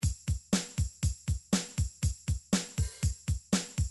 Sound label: midi drums drums hevrok